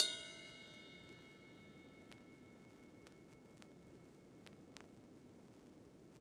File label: Vinyl Ride